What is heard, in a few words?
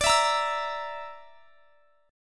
D Suspended 2nd Sharp 5th D, guitar; Dsus2#5; strummed